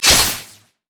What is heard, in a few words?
electrical surge attack; LoL; kennen